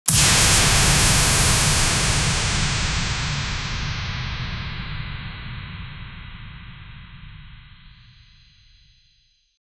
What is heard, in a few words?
electro virtual sword hit, vfx